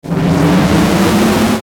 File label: Ascend Hard Static Swell; Production Element Imaging Element Accent Transition; Ascending